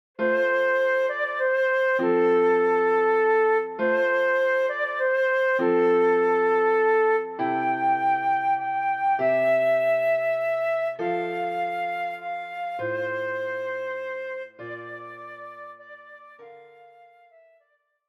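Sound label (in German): Stille Nacht heilige Nacht Querflöte, mit Begleitung; deutsches Weihnachtslied für Querflöte und Klavier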